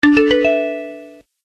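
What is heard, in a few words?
SFX item get; misao gamerip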